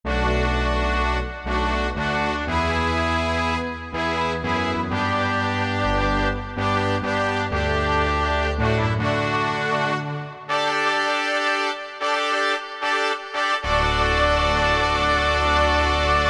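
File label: Brass Section, Korg MSC-1S; Korg MSC-1S Variety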